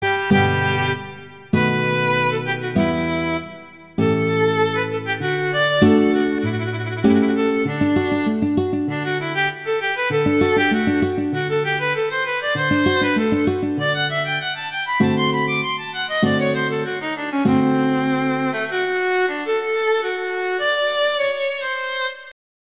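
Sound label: Adagio assai, violin guitar; Paganini; Sonatas; Op2; Sonata No 5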